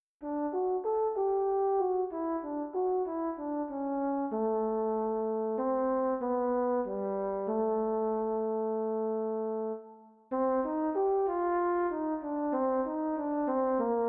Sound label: for brass